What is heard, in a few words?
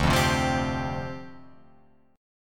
D Minor 11th D down Guitar Open F, Dm11 chord; Dm11 strummed; JGuitar